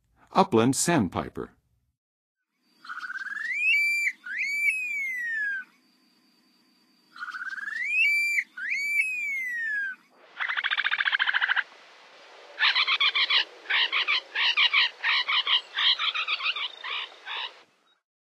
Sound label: Upland Sandpiper, Birds of North America; Western USA; Western USA Songbirds